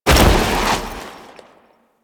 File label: Foley Roll; Warframe; Kahl